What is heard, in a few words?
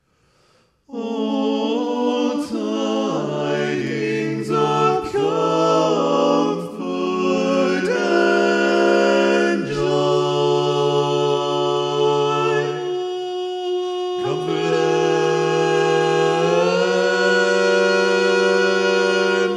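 baritone, barbershop tag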